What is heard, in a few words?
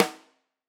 Tam Rim, drum